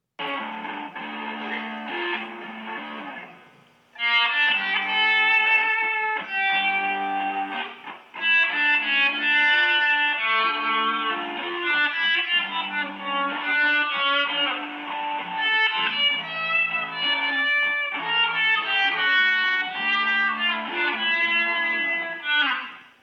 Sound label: waltz